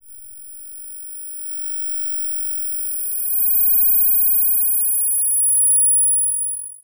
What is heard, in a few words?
Sci-Fi Electronic High Pitched Noise; Science Fiction